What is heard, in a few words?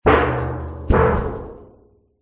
impacts metal oil drum fall over grass